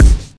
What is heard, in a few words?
crisp kick drum single hit A key, kickdrum sound tuned to the A note; kick drum samples